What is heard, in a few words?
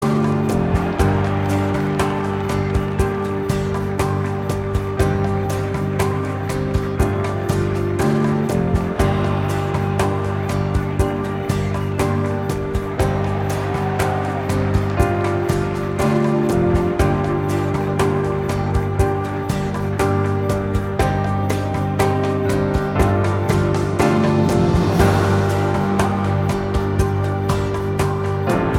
positive motivation